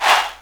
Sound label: SouthSide Chant